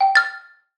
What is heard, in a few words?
sound new message